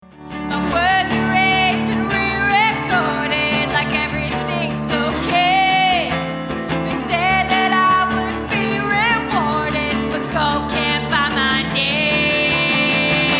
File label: ROCK